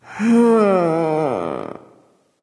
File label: sigh; smite; voicepack; nike